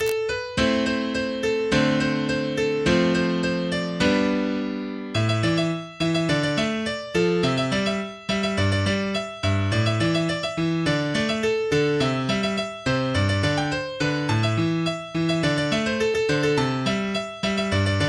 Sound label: Piano test